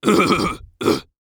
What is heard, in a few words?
Human Male Clear Throat Vibrating